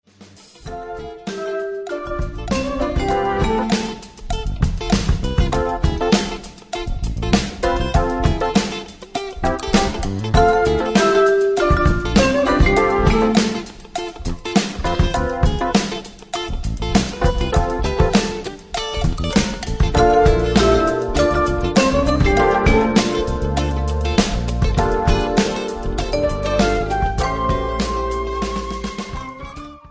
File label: Groove